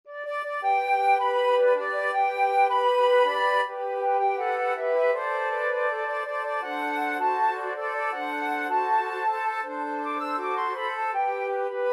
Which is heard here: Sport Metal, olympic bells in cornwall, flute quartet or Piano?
flute quartet